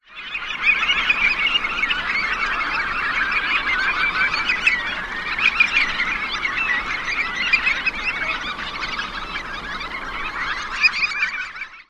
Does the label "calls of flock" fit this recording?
Yes